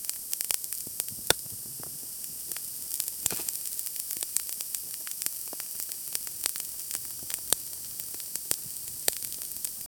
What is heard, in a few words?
barbecue grill sizzling